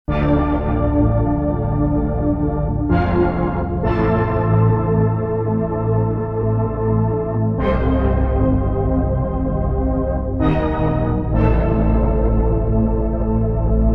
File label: Sequential Prophet X Soundtrack